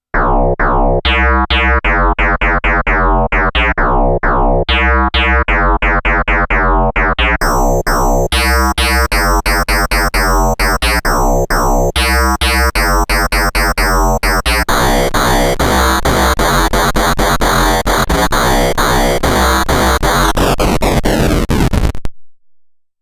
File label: FM Bass; Korg DCM8